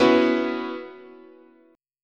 B Suspended 2nd Suspended 4th, chord, guitar

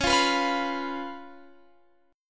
C sharp 7th C sharp, Guitar Open F; C#7; strummed; tuning=Open F; guitar chord

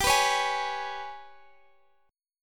G sharp Minor Major 7th Double Flat 5th

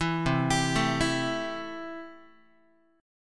C 6th, Guitar; C6 arpeggiated; 7,8,10,7,8,x

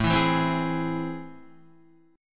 A Minor Major 7th, down, Guitar; strummed; AmM7; JGuitar

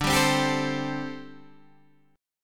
D 7th Suspended 4th, down, Guitar, Open G

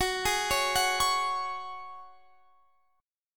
Gb Suspended 2nd, Guitar, Drop D; x,21,18,18,19,21; down; chord shape

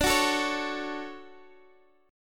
D-Major 7th, D, Guitar, Open D

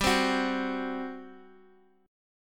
G Major 7th Suspended 4th, G, down

